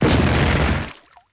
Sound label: boom; Canopia